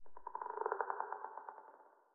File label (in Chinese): 受伤传导声; 嘎枝之心